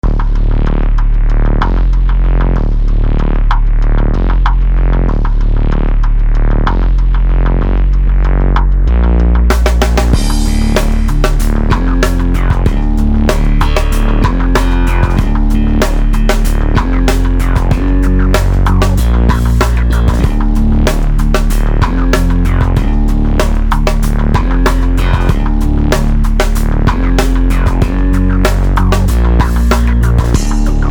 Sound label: Synth; Trilogy